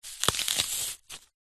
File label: food apple knife twist crush split